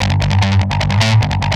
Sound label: Drive, Industrial Nasty